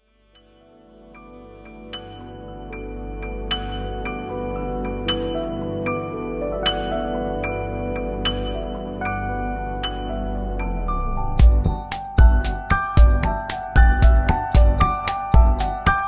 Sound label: wah wah waltz